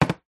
food golden gaytime crumbs in metal tin set down table